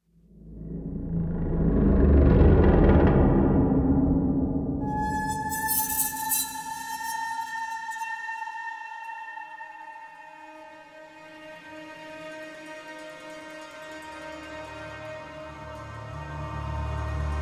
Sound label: thriller